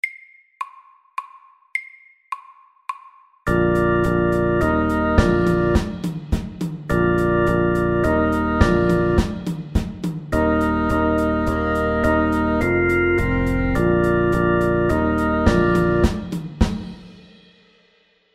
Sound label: Fusion MEDIUM 105